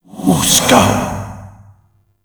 Alt Fire Whispers; Weapons

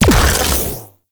Flame Laser Fire; Warframe; Corpus; Corpus Railjack; Spec Ops Fire Moa

